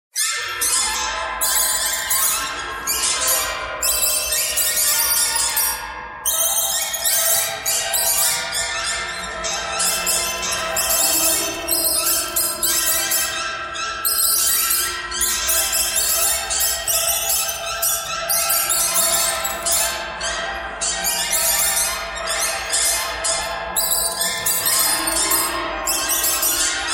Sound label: birds thread noise